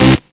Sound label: Synths C; Vengeance Samples